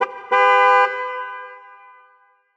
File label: car honk distant; ambient